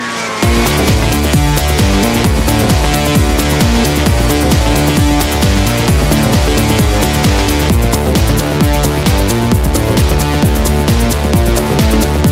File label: music ringtone, bollywood